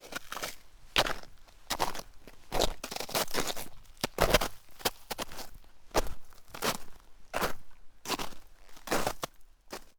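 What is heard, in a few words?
sounds of footsteps on ice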